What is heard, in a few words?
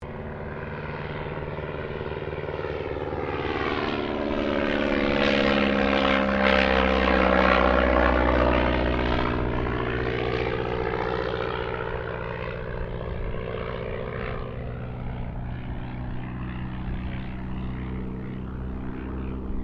Aircraft Dehavilland Tigermoth Pass, RAAF Tigermoth biplane training aircraft